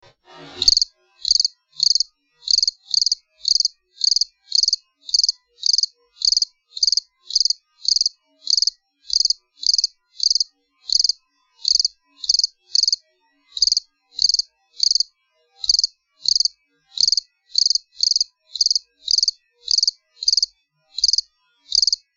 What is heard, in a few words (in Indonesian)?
jangkrik kebon